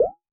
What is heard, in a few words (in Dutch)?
Ploep